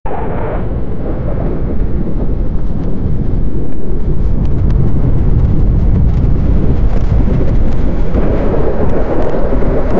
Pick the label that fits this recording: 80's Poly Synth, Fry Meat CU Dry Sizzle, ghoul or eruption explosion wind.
eruption explosion wind